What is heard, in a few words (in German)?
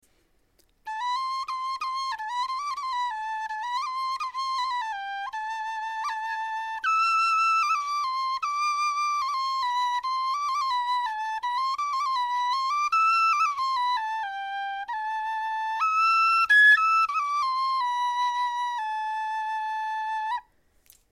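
melodie, Knochenflöten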